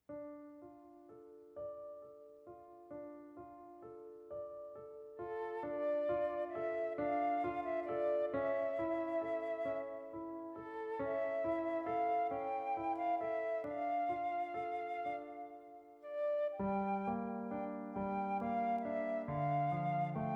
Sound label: Barcarolle